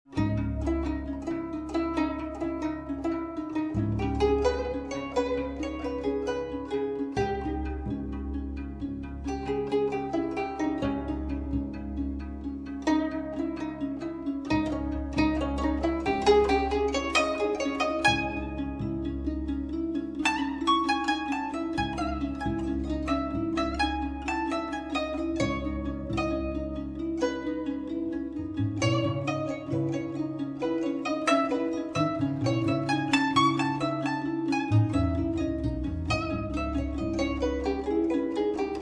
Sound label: Forrest Rain